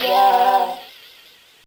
Powerlifter Fail

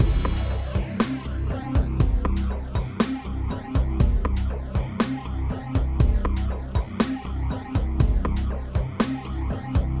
Indoor Horror; Pathologic

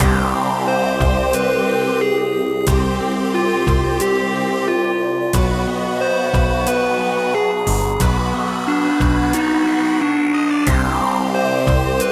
Menu; Imprisoned Light

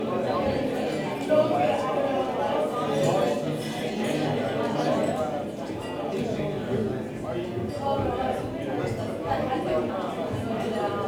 Cake Decorate Crowd; Levels Hub Orleans; Cake Deco Crowd Amb

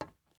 glass step

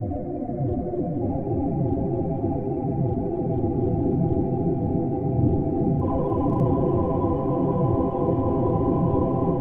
Happy Town E Ambient

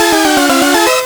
Beat

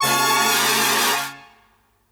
EXPLOSION

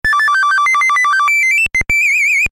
doot; Carpathian Night demo 2019 Halloween

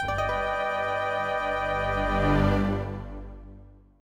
Meanwhile...; Marica Shinjitsu no Sekai; Saturn

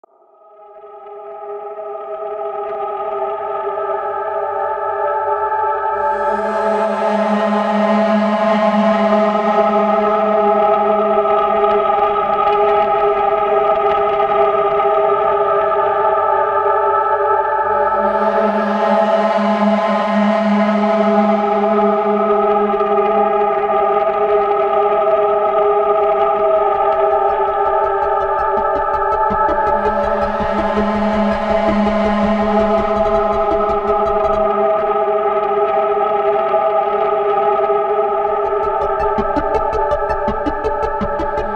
bossmusic; The Deer God; Wii U

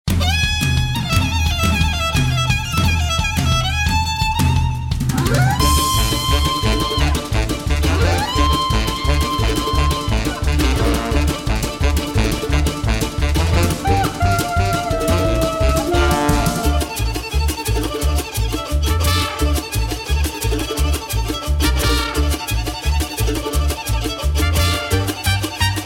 Klezmer Folk

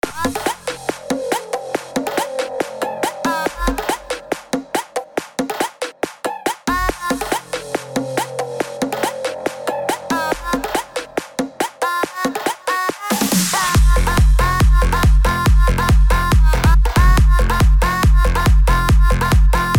Electro; Equalizer

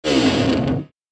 Stop; Troll Face Quest Unlucky; Android

